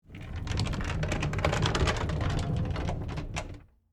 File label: Industry, Cart, Rotate, Spin, Wood, Surface, Slow; Spinning; Spinning Sound Effects